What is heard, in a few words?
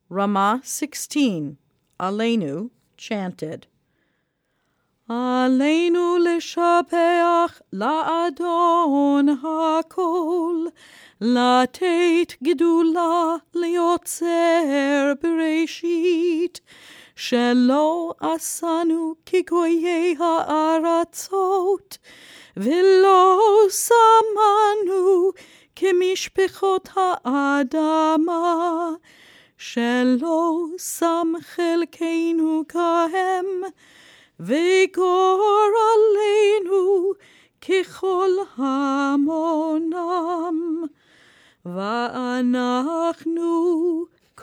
eleinu chant